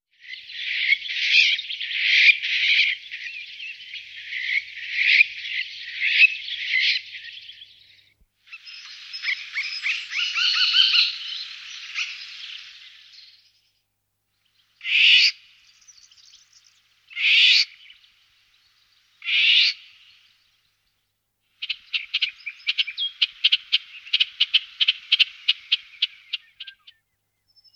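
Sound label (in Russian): obyknovennaya ivolga golos, golden oriole voice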